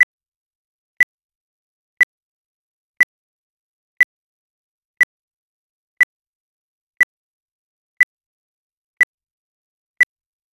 short crisp sound, button click

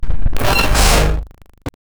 crafting fail sound dull

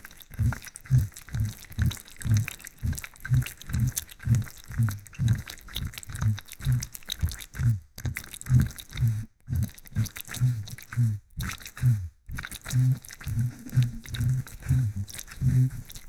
asmr of lathering shampoo; textures

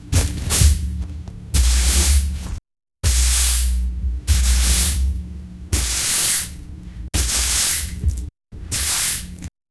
soft broom sweeping trash; cleaning

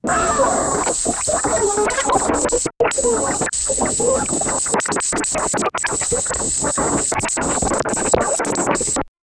morphing sounds microbiol; biology

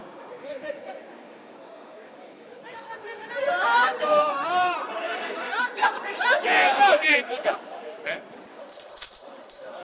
rap

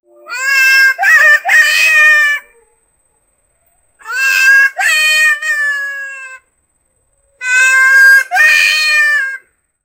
monkey crying

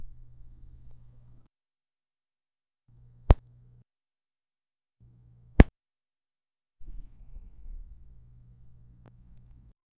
one poke on cotton very sof

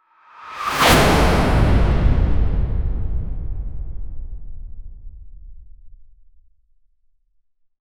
a sharp clean burst of, air burst

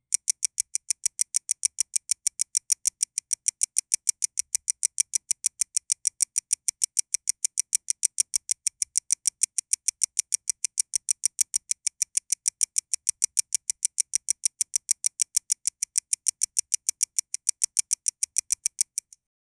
mechanical ticking rhythm, clock

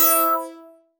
short ui, sine

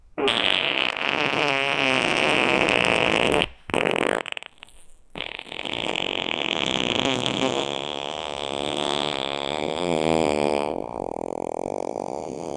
really disgusting long co, body sounds

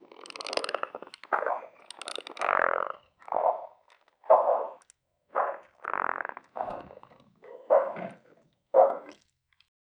monster

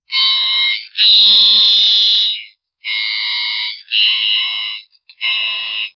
robot screeming at childr

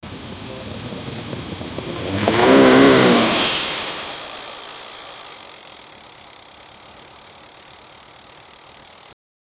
create sound of riding do, bike

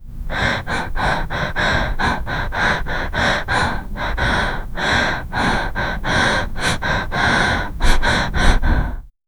a woman breathing after a, exercise

